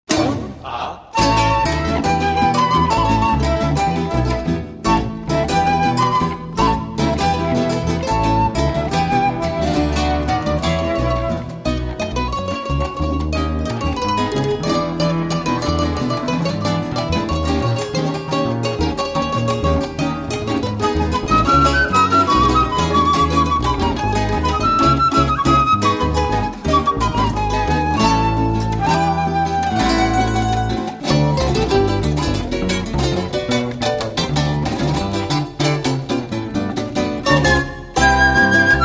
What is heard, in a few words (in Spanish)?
Merengue